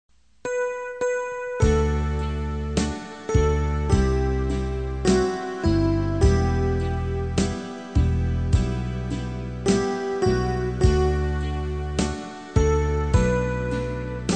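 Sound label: soprano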